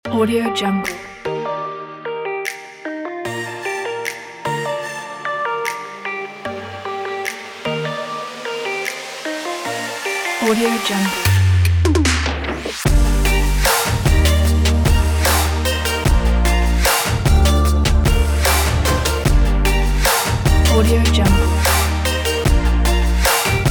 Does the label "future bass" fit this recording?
Yes